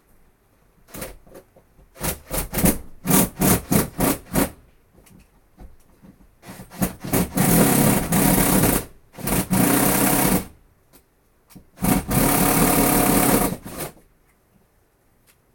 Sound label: gear